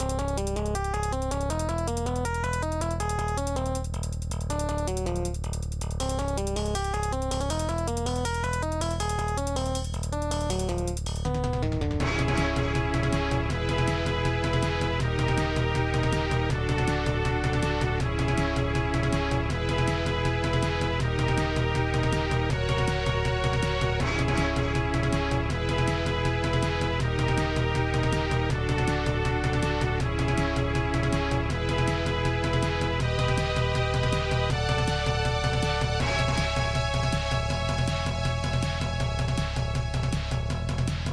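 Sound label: keygen song.xm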